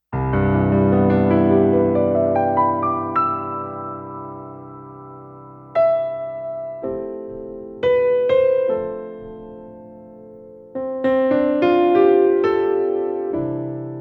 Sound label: Studiologic Numa X GT USA Grand